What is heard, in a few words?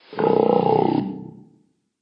snarl; Ze Franks Pain Pack